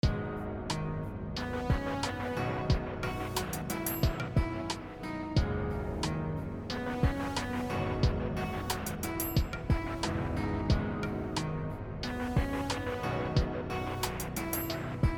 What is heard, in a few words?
pop